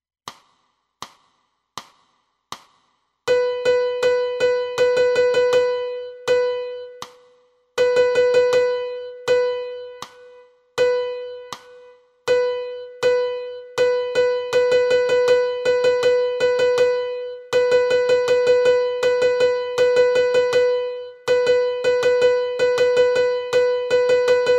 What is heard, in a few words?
piano